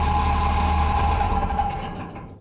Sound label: wheel Shut down; WarZone 2100